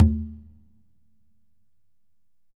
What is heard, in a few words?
Congas wet, drums percussion